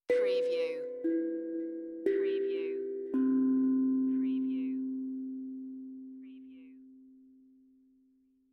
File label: Tongue Drum Meditative Tone; beanstalk audio